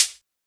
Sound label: HiHat, closed Hi Hats; Drums & Percs